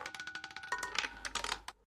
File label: ball stop, Roulette; European Roulette; Evoplay